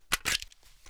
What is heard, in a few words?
mac11 mag out; weapons; garrysmod